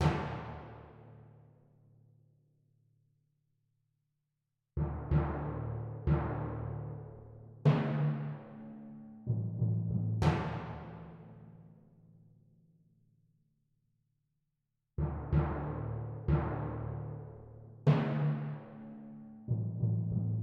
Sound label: Timpani Hits Loop from Bar 5; Factory